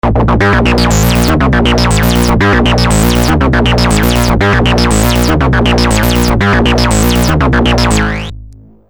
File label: midi clocked sequencer; neptune